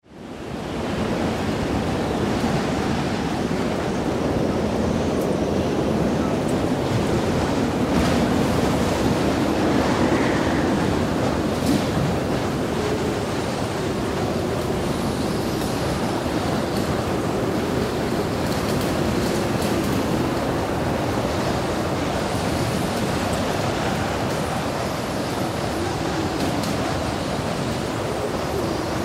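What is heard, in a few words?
howl of wind during a storm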